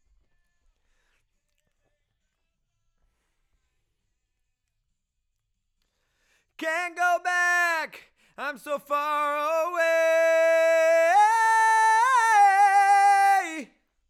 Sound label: LV Choru